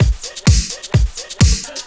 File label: Clubhouse Loop 128 BPM